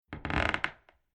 Household Old House Footstep On Wooden Floorboards Creaky Squeaky Eerie; Creaky Door